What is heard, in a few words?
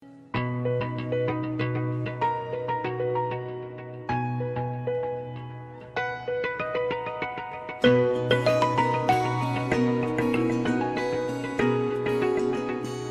samsung galaxy s iii ringtone